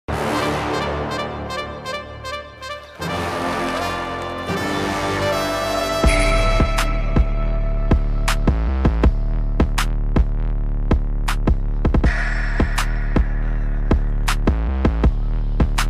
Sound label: sarpatta parambarai bgm